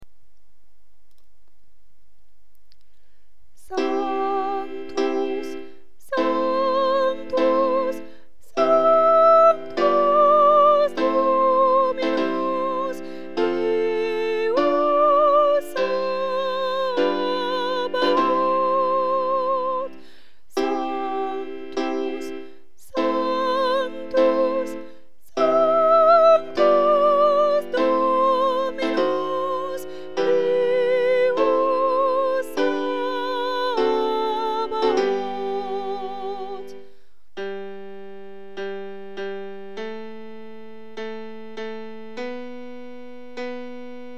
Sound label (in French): sanctus soprani